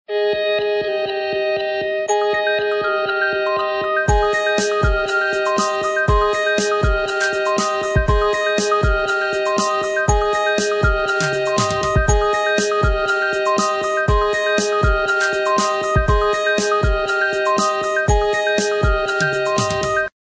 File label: music